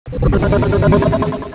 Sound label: pain; svencoop; portal house